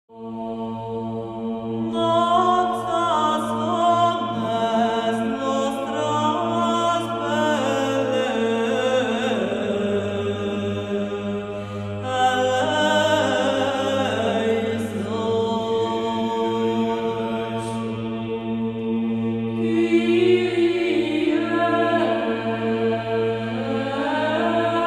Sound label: music